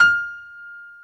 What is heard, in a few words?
PF BDF F 1, Piano; AKAI S6000 CD-ROM - Volume 1; STEREO G-PF 2